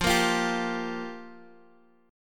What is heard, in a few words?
G Minor 7th F